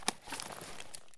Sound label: pm draw; Escape from Tarkov